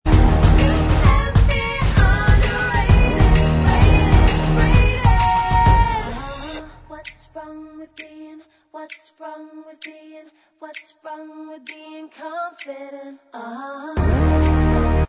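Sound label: backing vocals track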